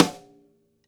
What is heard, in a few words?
long tail reverb snare sample a sharp key